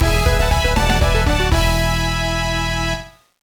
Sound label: Unknown Jingle; Golden Sun: The Lost Age